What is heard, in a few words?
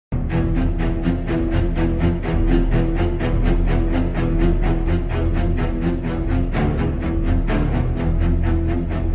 dundundun